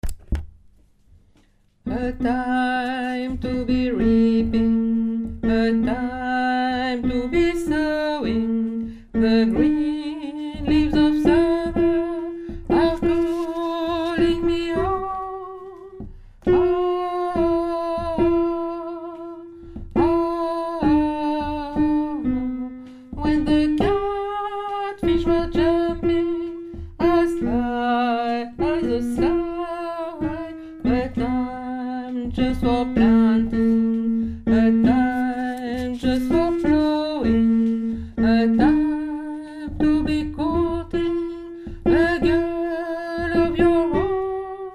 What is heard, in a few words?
alto